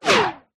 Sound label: warfare medieval fire arrow fire whizz past fast